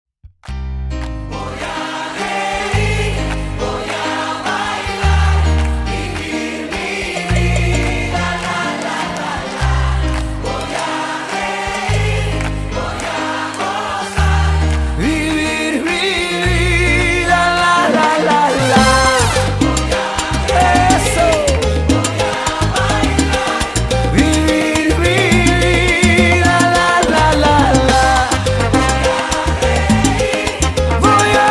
MUSIC DUO SALSA

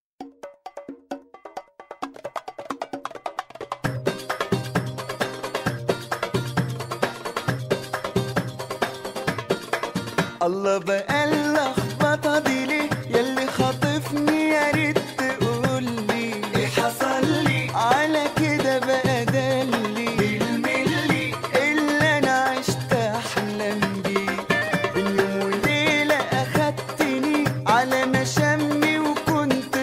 MUSIC DUO ORIENTAL

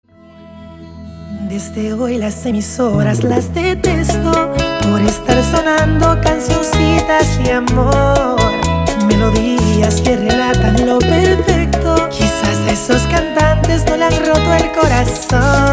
MUSIC SOLO BACHATA

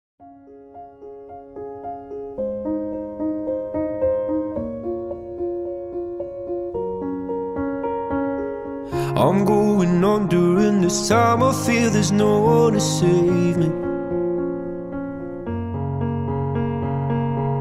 MUSIC SOLO CONTEMPORARY

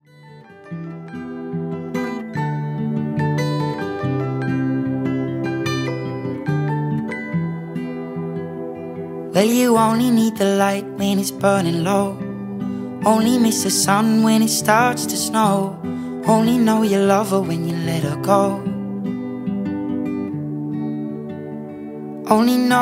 MUSIC SOLO CONTEMPORARY

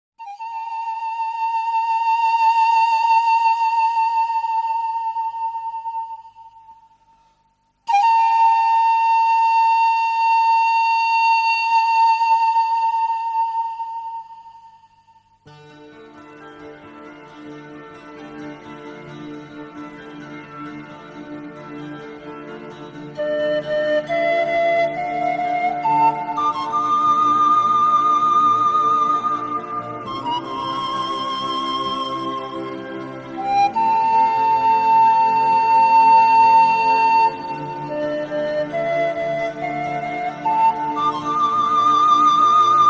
MUSIC SOLO CLASSIC DANCE

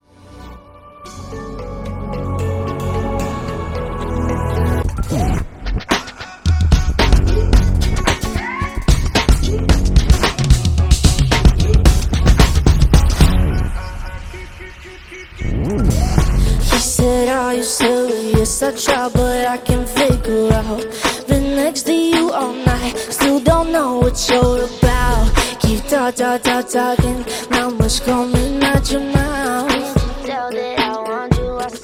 MUSIC DUO COMMERCIAL HIP-HOP

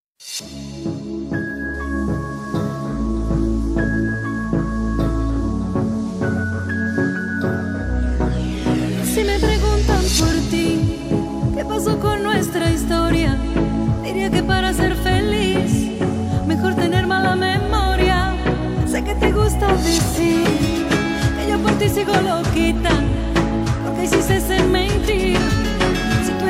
MUSIC SOLO KIZOMBA

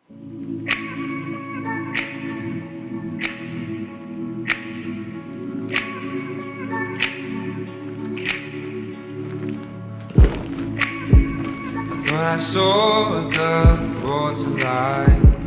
MUSIC SOLO JAZZ

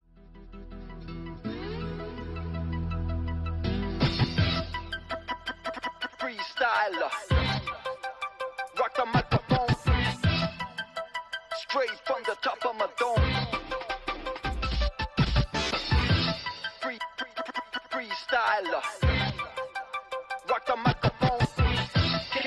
MUSIC SOLO STREET DANCE

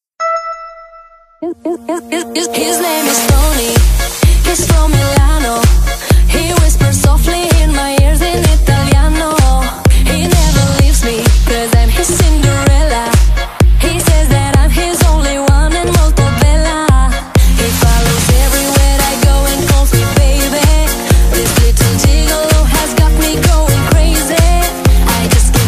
MUSIC SOLO RHYTHMIC GYMNASTICS

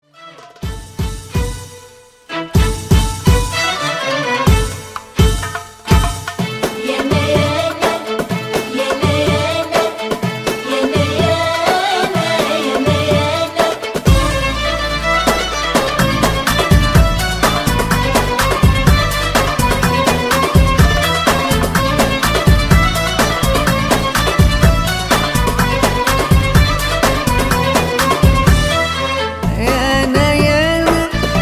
MUSIC SOLO ORIENTAL

